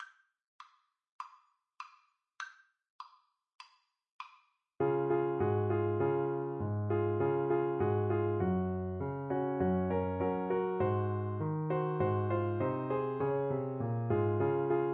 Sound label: jingle bells, Saxophone